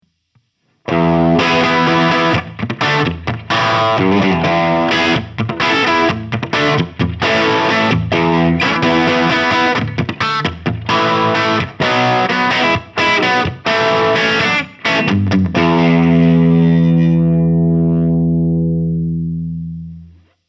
Sound City Master One Hundred Humbucker Normal

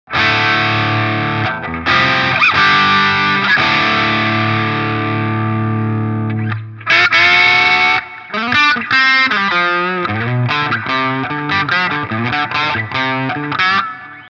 DRV Gain 9h Cut 12h Steg; 1981 Inventions

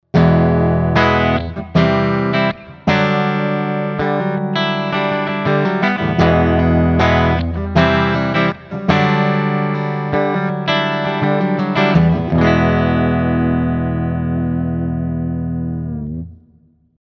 Framus Diablo Pro Bridge Humbucker clean

